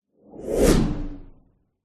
magic spell whoosh powerful energy pass electric

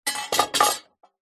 warfare sword small set down on hard surface

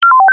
science fiction robot beep

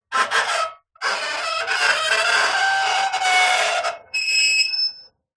foley metal scrape on metal screech; zapsplat

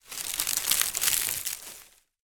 foley palm leaf dry crunch; zapsplat

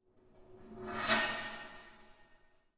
sound design metal scrape reverb shrill; zapsplat

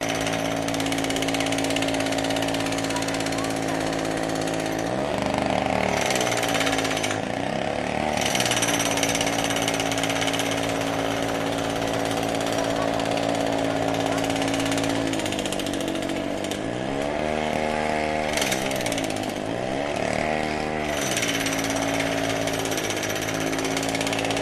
construction site jackhammer; la paz; your free sounds

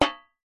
impacts metal tray hit muted hard

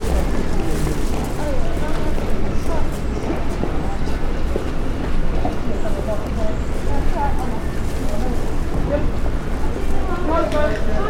ambience urban town pedestrians walk talk; sound effects one

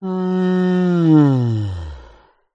fantasy giant male yawn

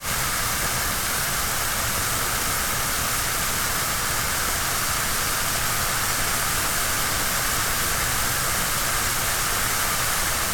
cooking stirfry frying sizzle

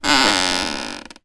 office chair creak; zapsplat

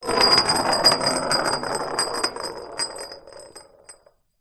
metal bar small roll marble surface; foley

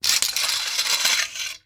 medieval sabre draw from sheath slow; still north media

